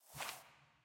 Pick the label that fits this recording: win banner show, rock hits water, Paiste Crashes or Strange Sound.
win banner show